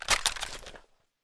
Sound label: m16a4 clip out; cso